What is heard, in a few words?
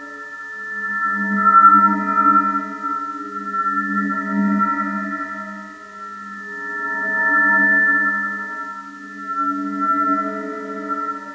crystal; quake2